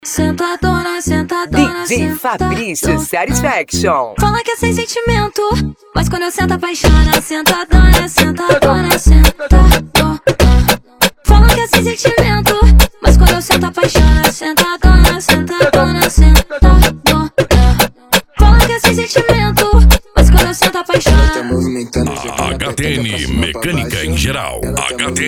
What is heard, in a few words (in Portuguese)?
deep e funk antigo